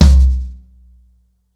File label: Bass Tom, drums singles; The Roots Drumloops